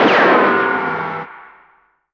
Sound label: Smoking Barrel, Drum & Bass Hits & Bits; Total Drum&Bass; 90 s Sample CDs